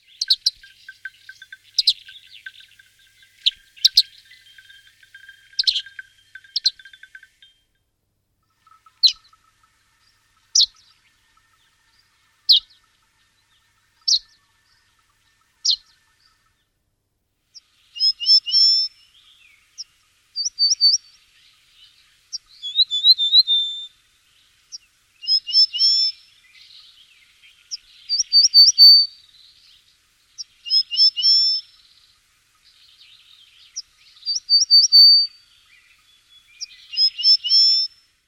strnad šedokrký

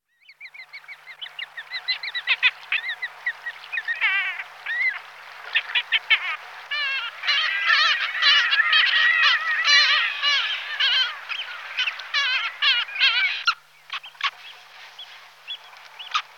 Chaluha prizivna Stercorarius parasiticus

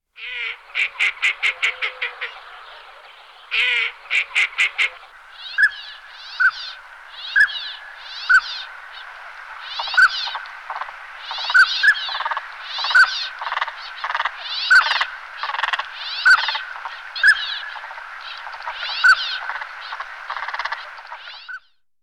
Ostralka stihla Anas acuta

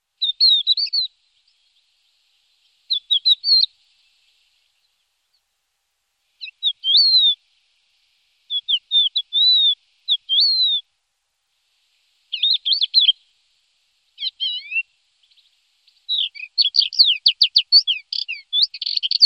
chocholouš vavřínový, nasiptaci

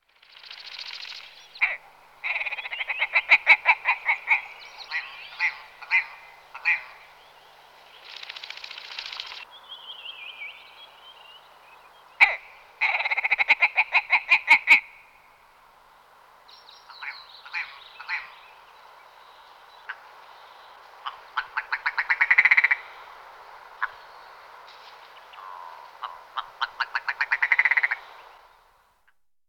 Belokur rousny Lagopus lagopus

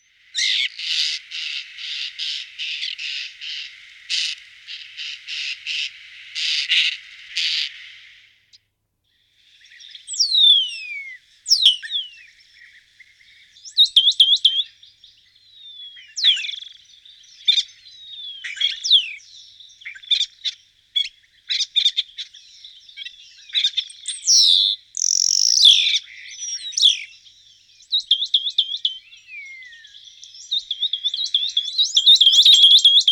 Spacek cerny Sturnus unicolor

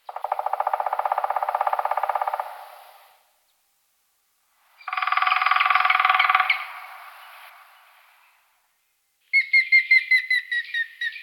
Zluna seda Picus canus, nasiptaci

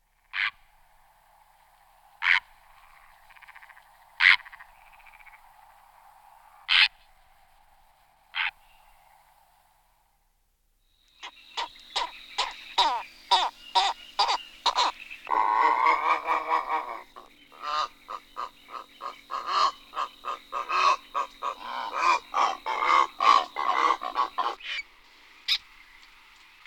Volavka cervena Ardea Purpurea

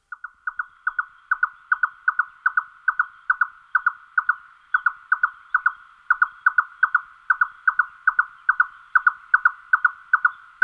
Lelek rudokrky Caprimulgus ruficollis

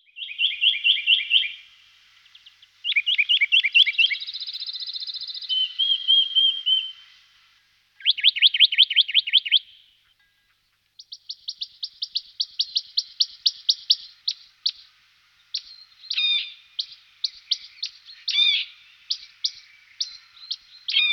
Brhlik skalni Sitta neumayer

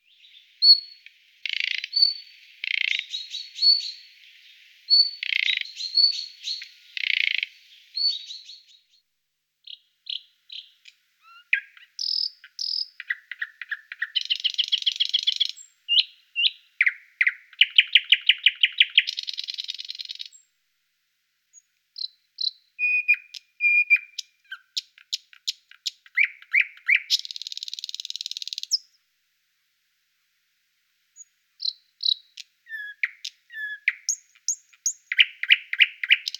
Slavik tmavy Luscinia luscinia

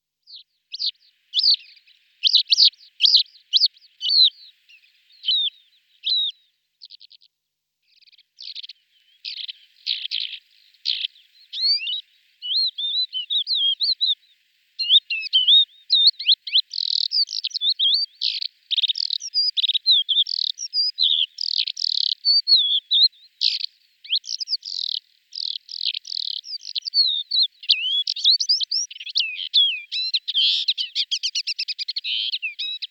Skrivanek mensi Calandrella rufescens

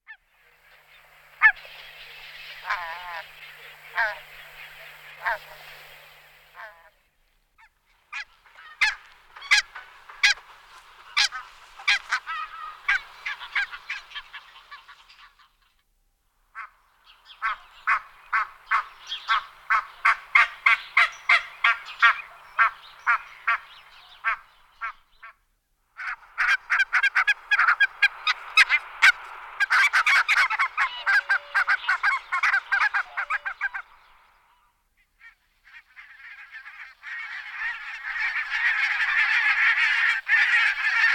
Berneska belolici Branta leucopsis

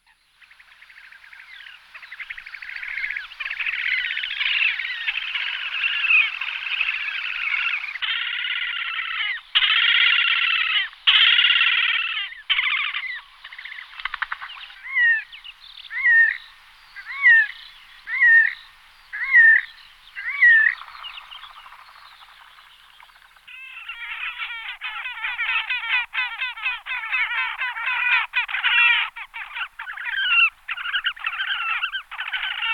Potapka zlutoroha Podiceps auritus

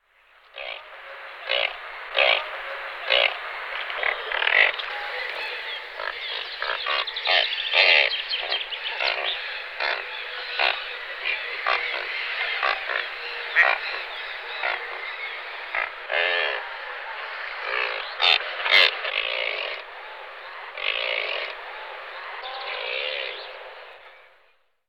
Pelikan kaderavy Pelecanus crispus

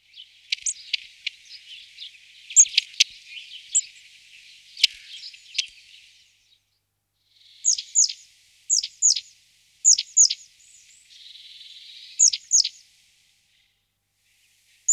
Mlynarik dlouhoocasy Aegithalos caudatus

